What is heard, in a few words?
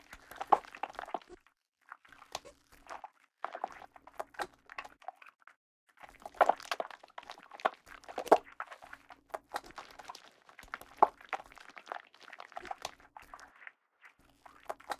tentacle; Monster girl quest